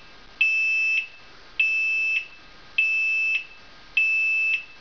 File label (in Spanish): INTERMITENTE